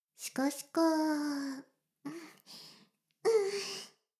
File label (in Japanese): シコシコ音声; 魔法少女マスカリマッスル